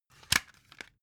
cd cover close hard

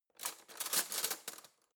cutlery spoon drawer handle long

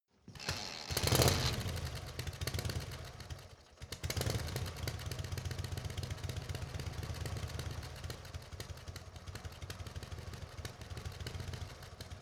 motorcycle harley engine start unsteady idle

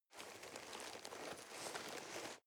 nylon leather soft rustling

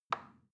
plastic button tiny release soft

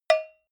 cowbell hit muted hard

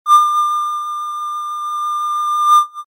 high frequency cut

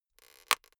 plastic slowly highly pressured

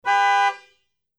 dodge horn

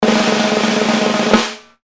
snare roll hit

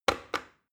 plastic button start click heavy slow

